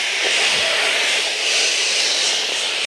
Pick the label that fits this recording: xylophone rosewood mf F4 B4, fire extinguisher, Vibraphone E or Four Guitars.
fire extinguisher